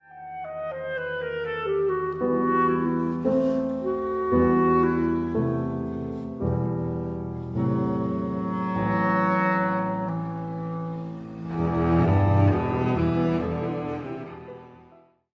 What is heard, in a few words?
cadences